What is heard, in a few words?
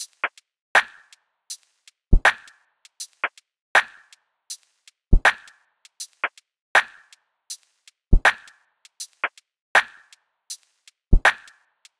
Top Drum Loop, 80 BPM; Cymatics; Eternity Sample Pack